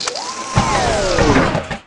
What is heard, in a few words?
Mech Death Slump; Tallan Mech; Aliens